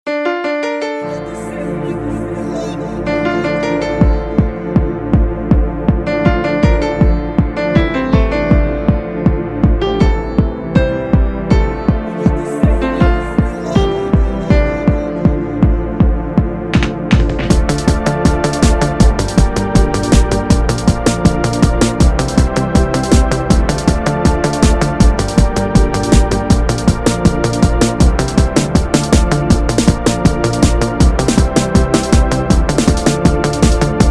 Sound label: instrumental club mix